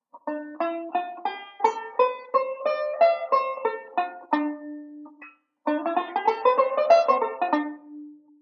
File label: Modal Sequence; Beyond Chord Melody Volume II